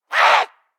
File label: attack, zombie; DayZ-Epoch; dayz sfx